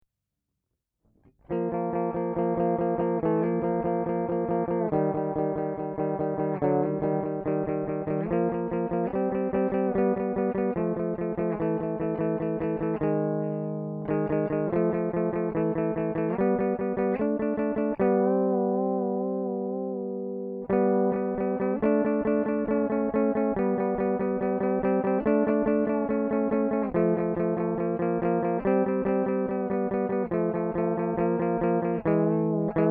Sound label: harmony 3rd 4th, whammy